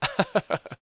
LAUGH, Vocal Jazz Fx

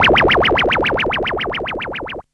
FX

